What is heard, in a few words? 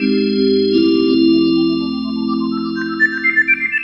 E PIANO 125, Keys; Houseworx